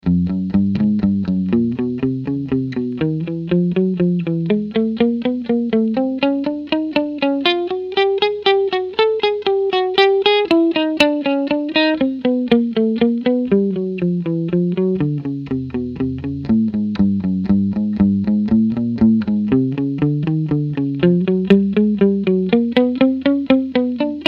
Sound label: Chromatic, guitar; practice guitar 15 minutes